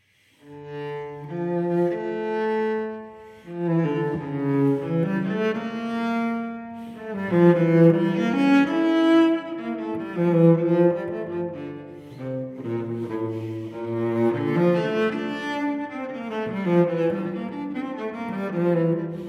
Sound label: Cello Suite No. 2 in D minor, Prelude; BWV1008; Johann Sebastian Bach